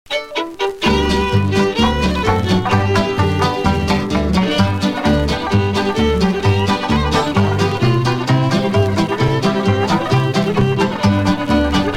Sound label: instrumental